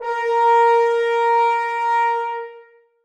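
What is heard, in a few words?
horns sustain a#4; Sonatina Symphonic Orchestra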